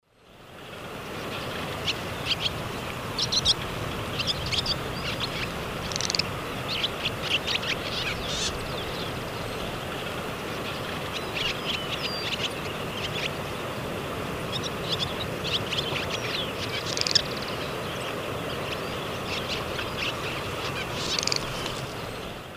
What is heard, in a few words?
Hirundo rustica, flight calls from flock; S. America; Eastern Lowlands